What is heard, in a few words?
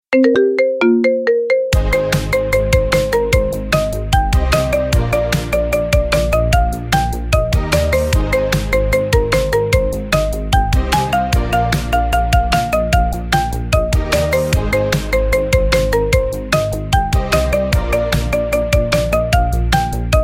marimba marimba remix